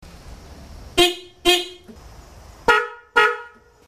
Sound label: horn, eurohorn